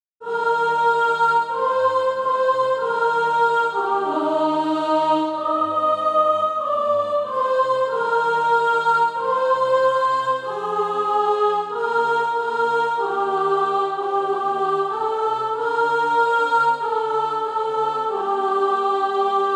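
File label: soprano, SATB Practise Music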